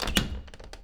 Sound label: OPEN, household; AKAI Anime Sound FX